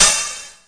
sp12, EMU SP 12; DRUM MACHINES; Kult

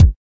kick

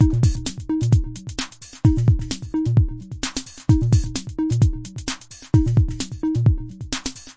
analog, drum loops and breaks; 121 to 130 bpm; soundsonic nks analog berlin

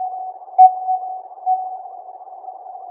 sonar; VIDEO GAMES

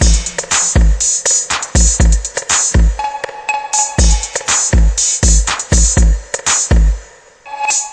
121 bpm big bright breakbeat

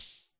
Roland RHY55, DRUM MACHINES

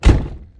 Electro FX; Ueb HE Electro FX

